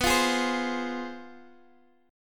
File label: F Minor B; Fm/B strummed; JGuitar